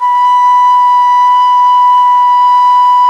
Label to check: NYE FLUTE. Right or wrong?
Right